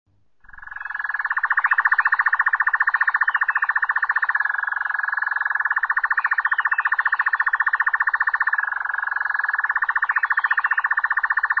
Square Tailed Nightjar

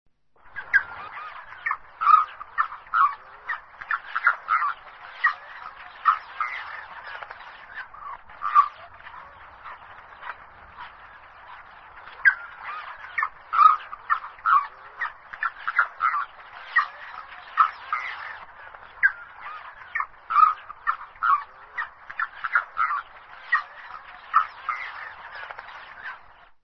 Reed Cormorant

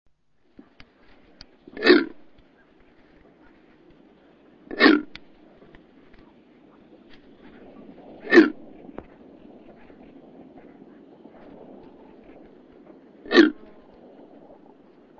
Kori Bustard

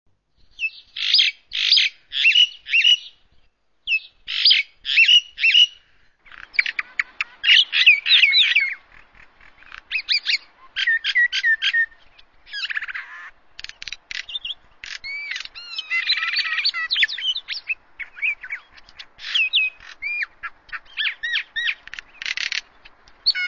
Common Myna

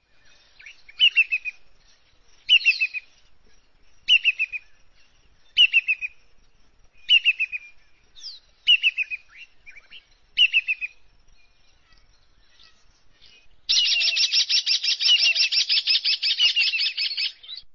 Brown Hooded Kingfisher

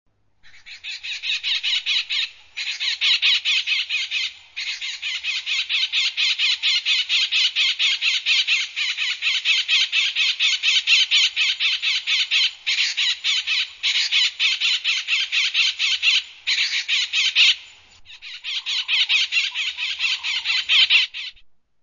Southern Pied Babbler

